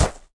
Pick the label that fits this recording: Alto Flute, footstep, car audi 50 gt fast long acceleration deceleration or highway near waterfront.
footstep